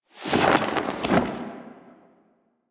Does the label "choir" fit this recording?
No